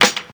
original clap b key, Royality free clap single shot tuned to the B note. Loudest frequency: 3063Hz; percussion single hits one shots